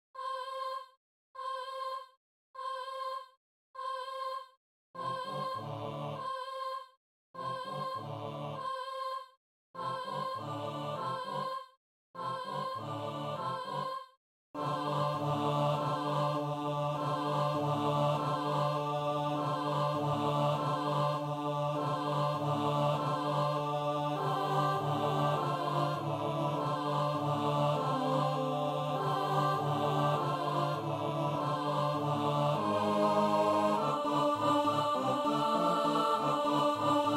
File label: Ovce midi